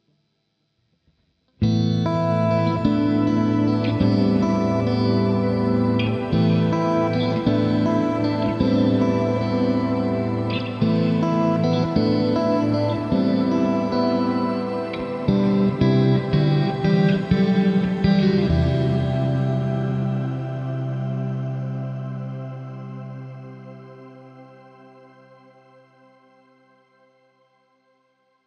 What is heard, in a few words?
Fender Twin Shimmer Ambient